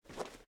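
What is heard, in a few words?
footstep armor; Smite; god Kuzenbo v2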